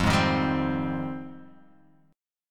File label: F Minor 9th, F, Guitar, Open G; 3,1,1,0,1,x; down; chord shape